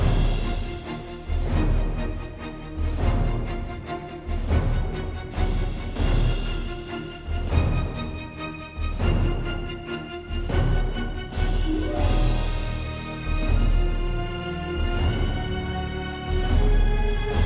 Combat Boss Final Threat1 140 Ebm

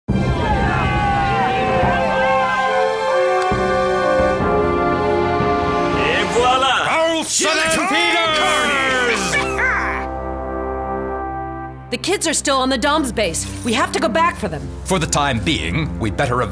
Cinematic Success; beyond good evil; Windows, Xbox

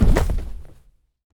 Body hit

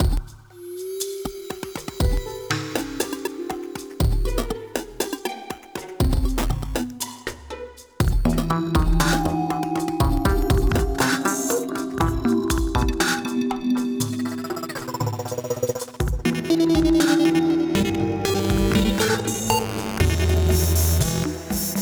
Alternate Underground

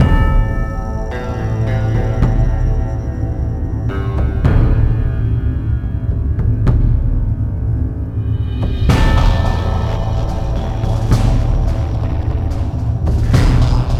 Secret Cave (Ambient); Eternal Legacy; Android

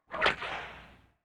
Whip

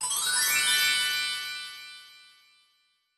Magic; mahouteq

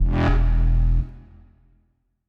New Day

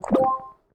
Heal Hunger Good

